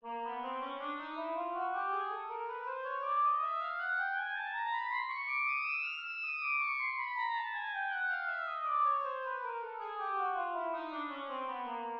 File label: Oboe Chromatic Scale